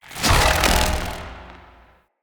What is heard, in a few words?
Galvanic Button Power Down